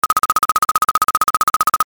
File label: fishing reel